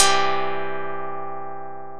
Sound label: karplus strong